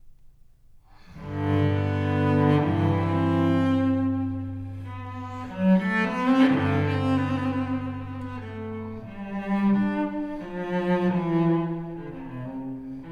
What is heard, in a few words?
Sarabande, Suite No. 1 in G Major; BWV1007